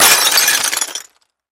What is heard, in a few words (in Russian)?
zvuki zerkala